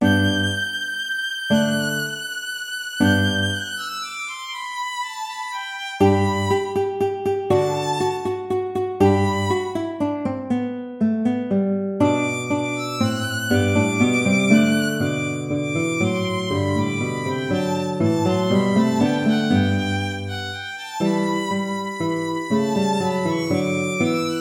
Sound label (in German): alter ungarischer tanz